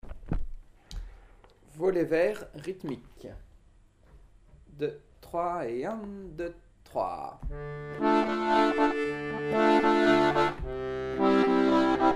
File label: rythm; ateliers diato 2